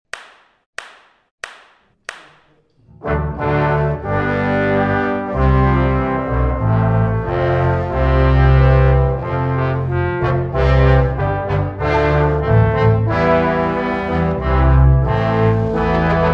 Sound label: orchestra